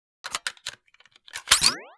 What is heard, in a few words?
blaster reload